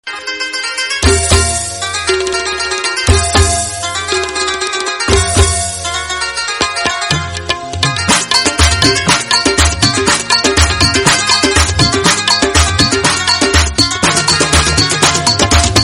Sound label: pashto muzic; ringtone